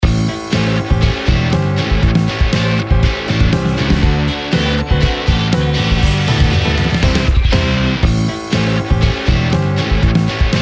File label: API MIX